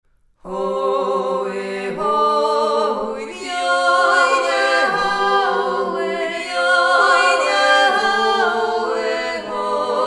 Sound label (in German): Wasserfall 3stimmig Jodler; da wasserfall